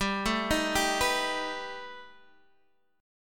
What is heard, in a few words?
B Augmented 7th G, chord